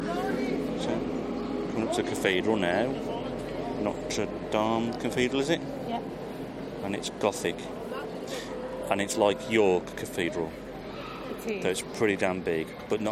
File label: outside Rouen cathedral with local soundscape and amazing French accordion player; in normand